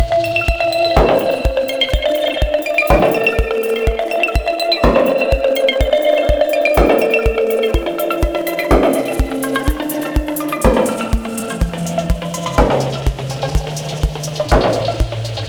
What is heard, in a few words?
dub cut b, Loops; Polyend